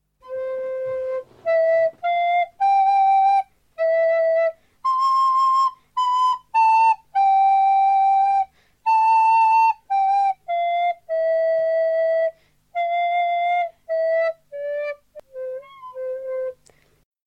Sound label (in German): klang